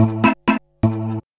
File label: lop 126bpm, House Instr and Stab Loops